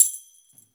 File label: TAMB, PERC; AKAI S-Series CD-ROM Sound Library VOL-2